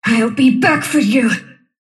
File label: sylph death; dota; dark willow